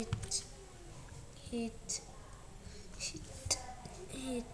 hit

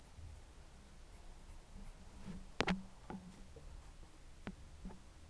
laugh

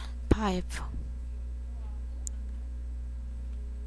pipe

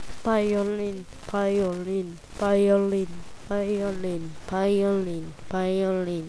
violin

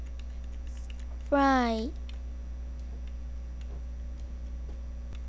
fry

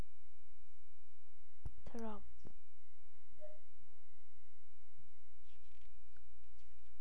drum

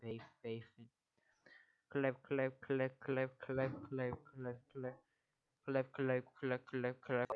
clap

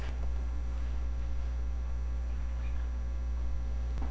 rainforest

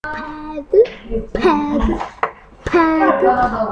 pad

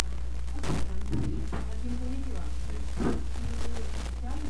charge

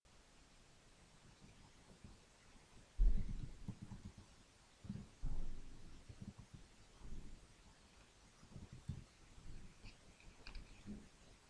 throw

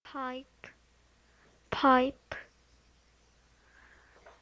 pipe

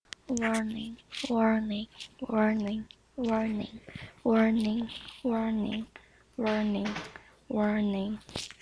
warning

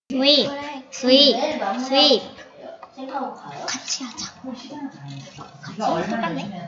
sweep

